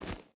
footstep rubber boots gravel run; Rust

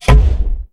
atgm pump

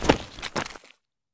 bodydrop gravel; Escape from Tarkov

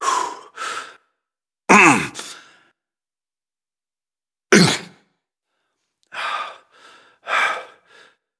chase fight long

